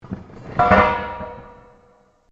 METAL ON METAL LARGE IMPACT; Materials

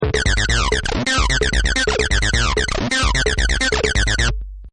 Synth 303; Button Sound Effects; Multimedia; Partners In Rhyme

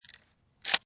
12 Gauge Load; 870; War; VRSonic

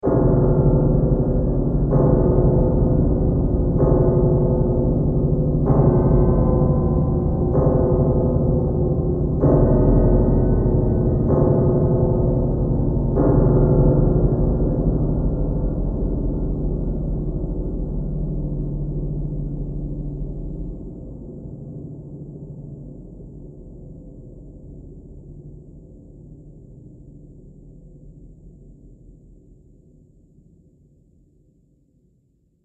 Horror Dark Piano, Piano Sound Effects; musical

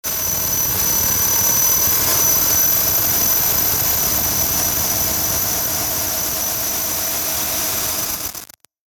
static high; Static High Frequency

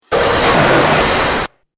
Droid Out Of Order, robot; Robot Sound Effects

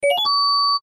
Cartoon Arpeggio Fast Musical; Cartoons; Blastwave

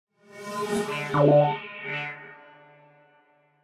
Filter Whoosh Rear To Front; 5.1 STEREO MIX; Blastwave

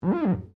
GLASS SQUEAK FINGER

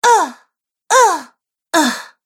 Woman Groans Ugh, Groaning; Groaning Sound Effects

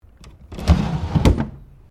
Drawer Cabinet Wooden Large Heavy Full Close; Various domestic drawers; Household

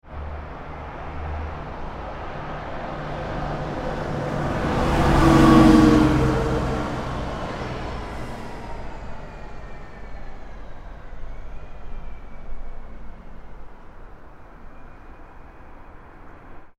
transportation bus drive by wet road; Bus Drives By And Slows On Wet Road; Bus Passes On Wet Road; Busses; Vehicles

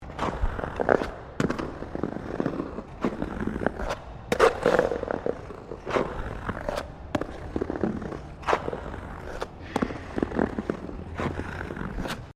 Ice skating Jump Maneouvering, Figure skating; Ice Skating (Sound Effects:Sports)

